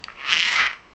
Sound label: anthropomorphic red eared